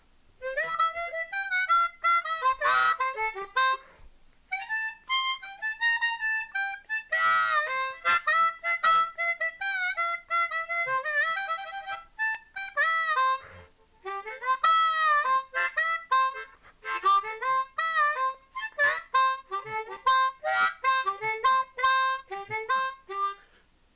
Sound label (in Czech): foukaci harmonika